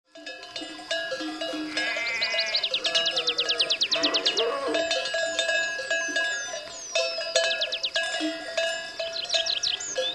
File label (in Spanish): El rebaño pastando